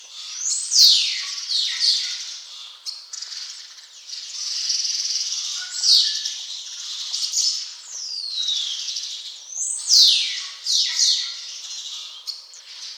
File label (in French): etourneau unicolore